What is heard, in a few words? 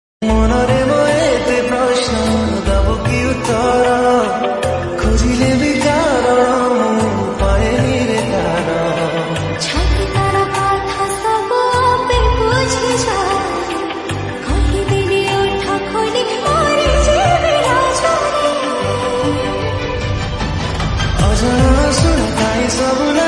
Odia Song Ringtone